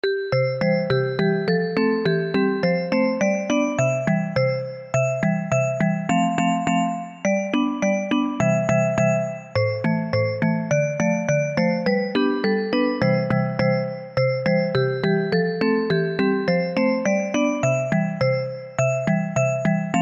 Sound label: Marimba Ringtone